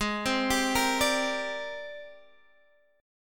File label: G sharp Major Sharp 11th, Guitar Standard; x,11,10,12,11,10; G#M#11 arpeggiated; capo=2